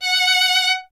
STR VLN, Vln Detache; Miroslav Vitous

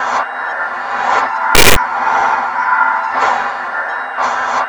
UNDERWATER; COUSTEAU; Spectrasonic Distorted Reality 1

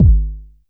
BD, Casio CTK-5000

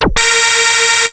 TECH KIT, RAVE

